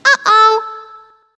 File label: Oh Oh Notify Tone